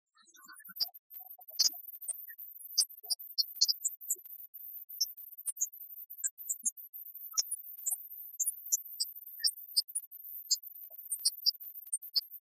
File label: fast beat ringtone